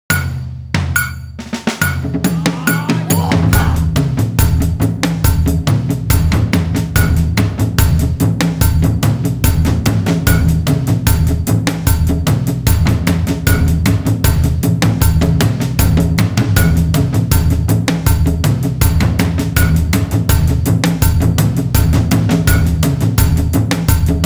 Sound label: Metronome Track; Taiko Towers; Taiko Tower 01